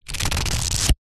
household book cub scouts record small flick through pages; zapsplat